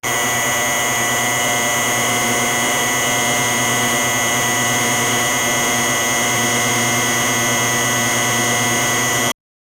industrial buzzer alarm

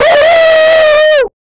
a painfull elephant roar; animal

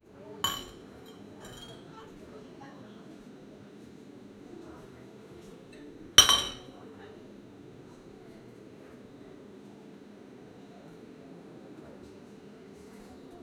cafe background with dish

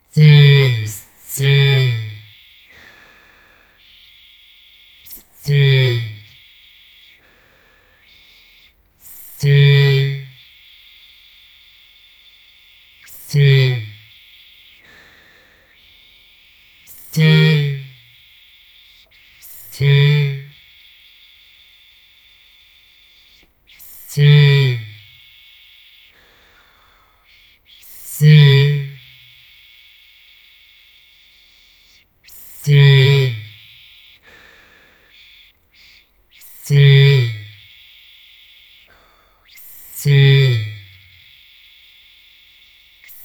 woman saying long pssssss

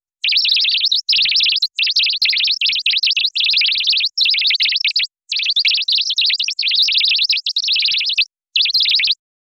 happy robot chirping; humanoid

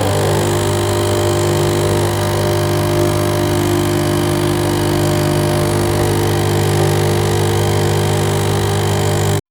coffee machine sound

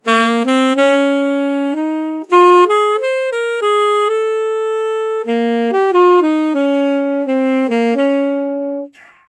sad saxophone

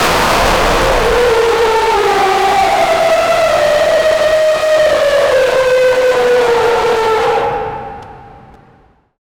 a piercing shrieking roar, creature vocal

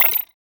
futuristic cyberpunk digi

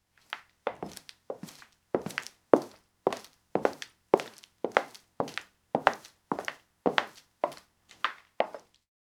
concrete

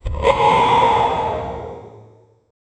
evil robotic laugh

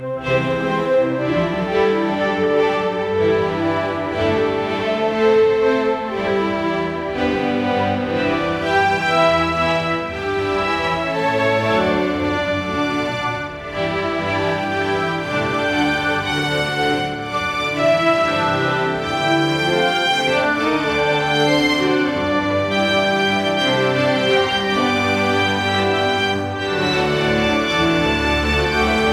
magical orchestral theme, loopable

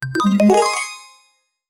merge 3 mobile game combo

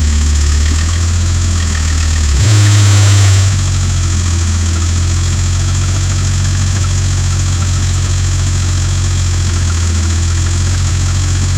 the powerful v12 engine; automotive

